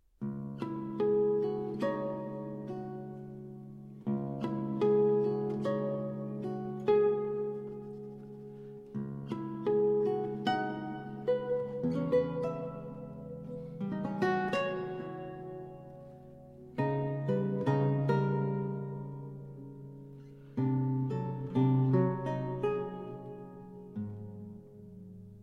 English Folk Songs; I Am a Poor Wayfaring Stranger (Arr. Leo Brouwer)

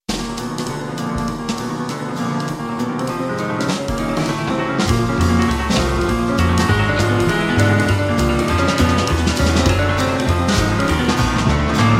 In E-Flat Minor, Arr. For Jazz Ensemble

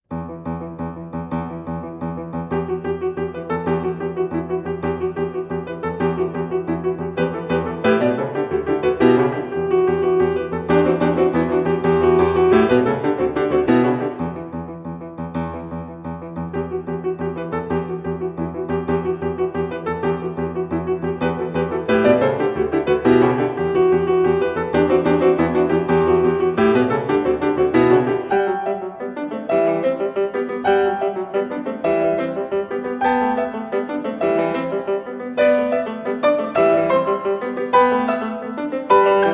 Studies In African Rhythms; 24 Studies In African Rhythms

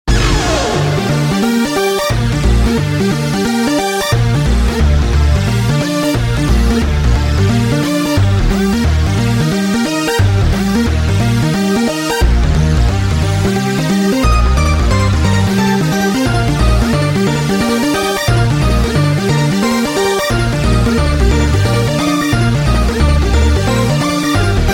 BPM 178

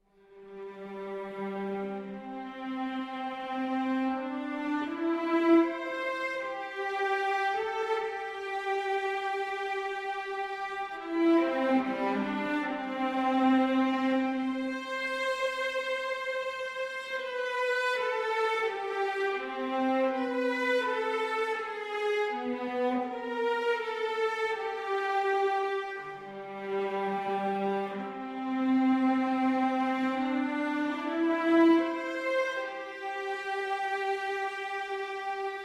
String Orchestra Adagio

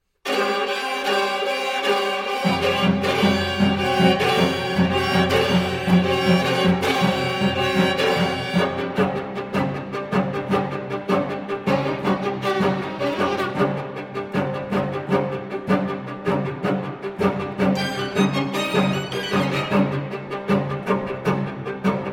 String Quartet in C, Allegro molto

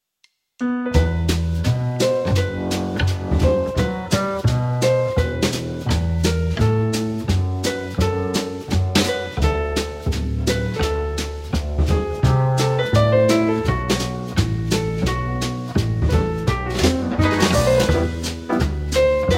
In E Minor, Jazz Ensemble

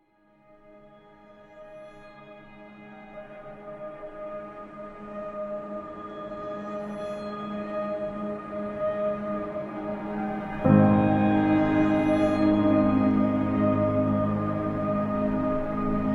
Theremin Lullaby

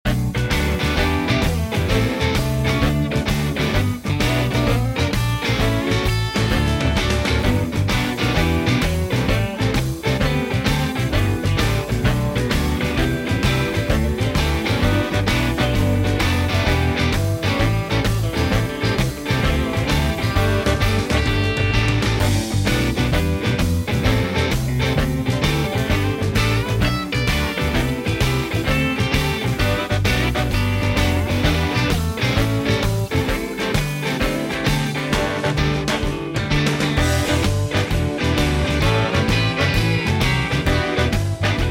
Instrumental Blues

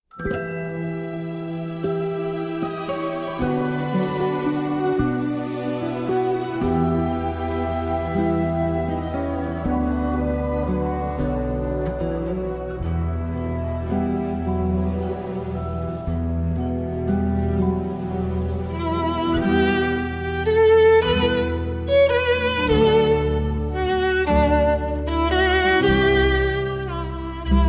Greensleeves, For Violin and Orchestra; Traditional; Arr. Garrett Van der Heijden

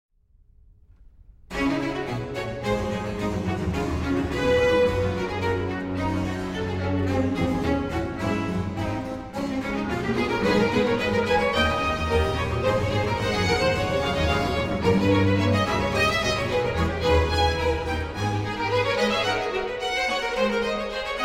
Concerto in D Minor For Two Violins; I. Vivace

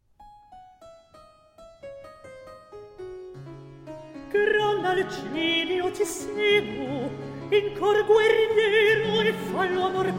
Recitativo; Alceste

